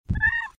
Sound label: Meow